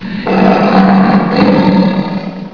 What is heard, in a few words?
growl, tiger